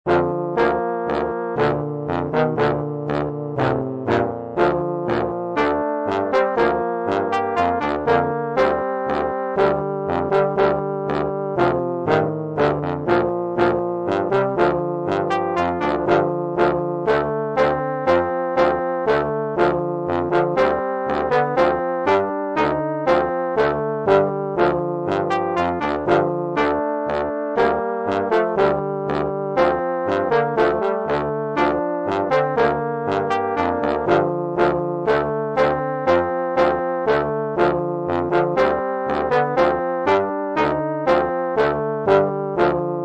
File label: panzer lead